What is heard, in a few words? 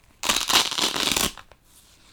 Velcro